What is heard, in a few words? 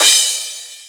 soft hit finish